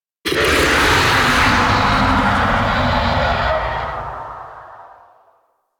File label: Kraken Call; Warframe; Warframes; Pirate